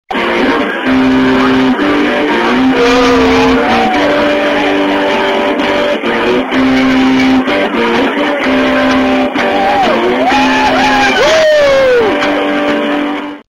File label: smoke water